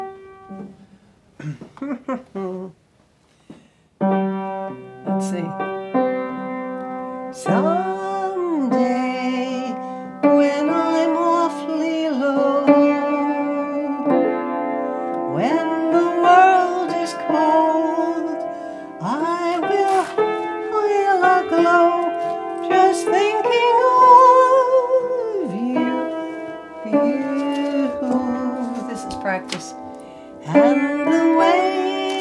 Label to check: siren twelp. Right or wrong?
Wrong